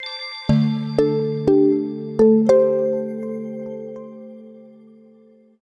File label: Startup tone; Samsung Galaxy S